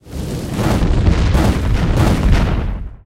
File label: fire spell; inlogic-castledefense